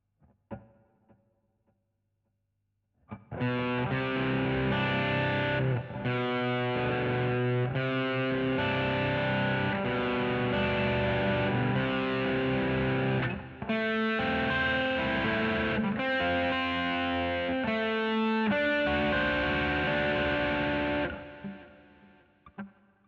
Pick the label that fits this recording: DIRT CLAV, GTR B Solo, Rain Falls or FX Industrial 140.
GTR B Solo